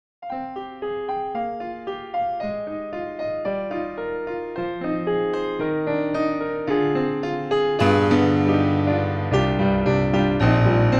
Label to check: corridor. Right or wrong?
Wrong